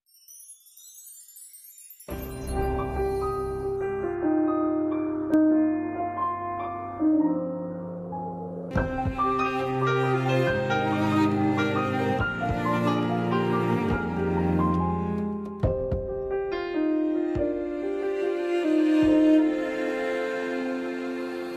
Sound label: winter piano gymnastics floor music